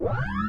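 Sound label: plasma rifle power up; allegiance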